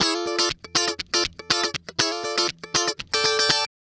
Guitar Licks

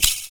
FOOT PERC; Univers Sons - Raricussions

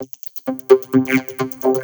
Percussive

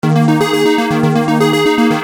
Arpeggio 120 D#, Techno Synth Loops; Sonic Foundry (Sony Creative Software); Signals I

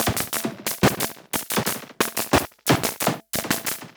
Modular Noise Rhythm (120BPM)

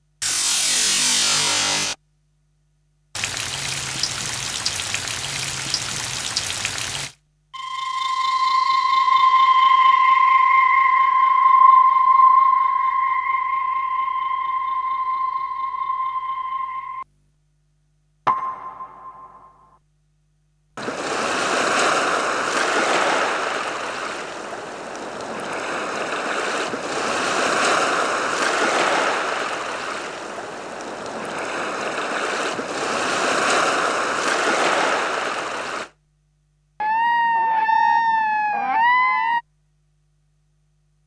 Sound Effects; Kniteforce; 1000 Samples Volume 1

